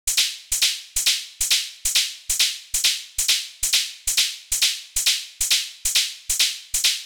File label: Hihat, 135